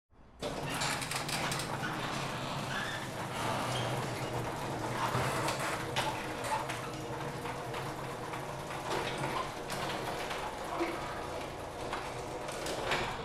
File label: Garage Door Close